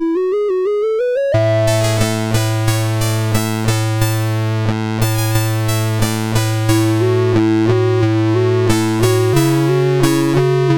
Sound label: Synth Sample, sid CSG8580R5